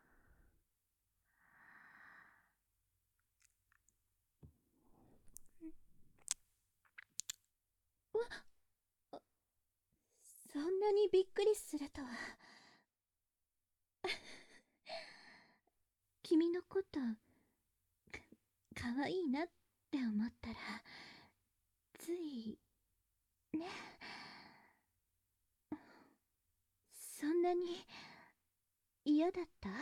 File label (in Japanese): （環境音なし） 左耳舐め; ちょっと休憩…？（左耳舐め）